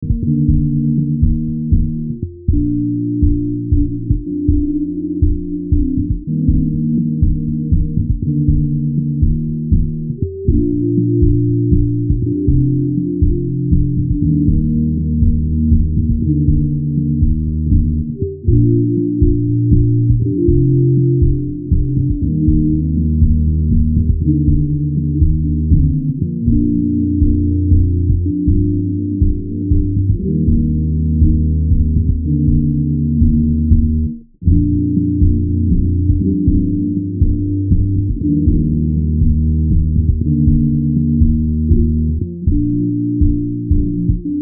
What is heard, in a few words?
Low Hz Bandwidth